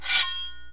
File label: knife reload; gusanos promode